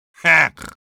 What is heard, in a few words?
laugh short; Risa corta